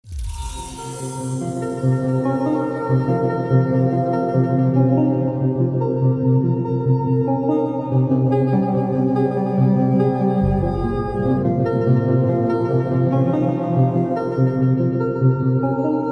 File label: Hacking Music